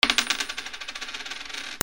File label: MONEY